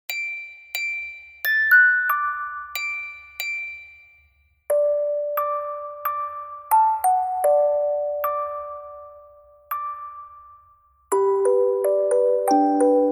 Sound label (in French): carillon